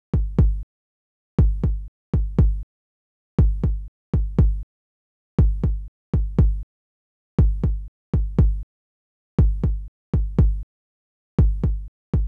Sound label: kick hiphop; hydrogen